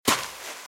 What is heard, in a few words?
Footsteps Sand Dry Walk Left; RadwinIsland